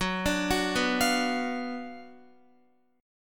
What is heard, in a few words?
F sharp Major 7th Suspended 4th Sharp 5th, F sharp, down, Guitar; F#M7sus4#5 Chord; arpeggiated